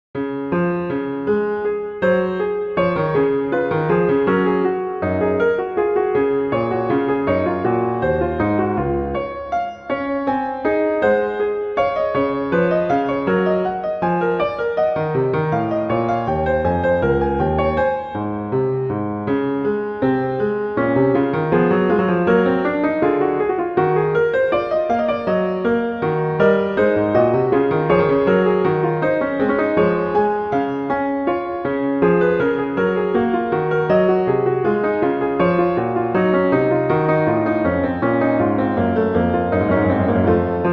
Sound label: Fugue; Bach; Prelude Fugue III